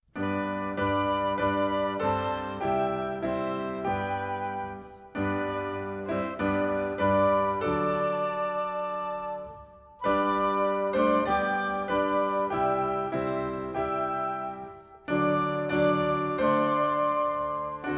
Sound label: love at home vocal and instrumental